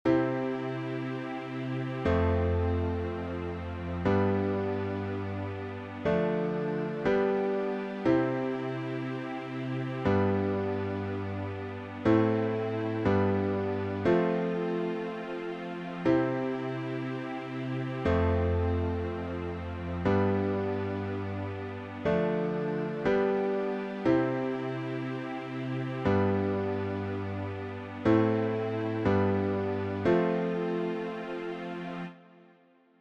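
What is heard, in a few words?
C Major nice chords progression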